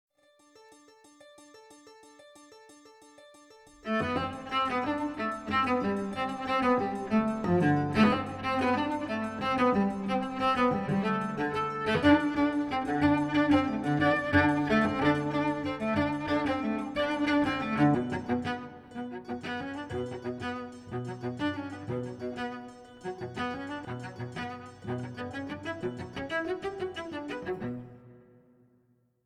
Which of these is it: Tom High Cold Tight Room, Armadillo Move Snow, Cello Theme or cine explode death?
Cello Theme